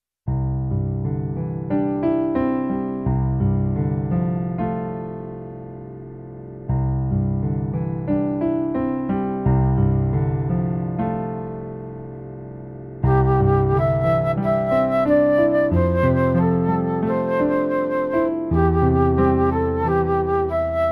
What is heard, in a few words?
flute